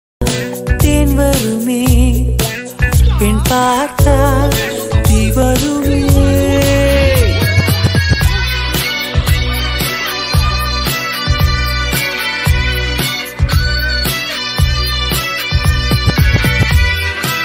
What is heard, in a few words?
bgm tamil